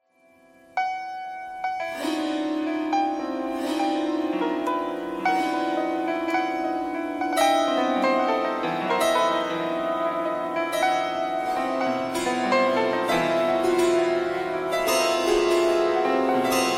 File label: piano thread noise